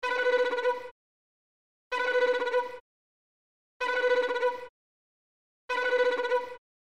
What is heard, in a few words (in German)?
Klangbeispiel Geige; vermittlung neue musik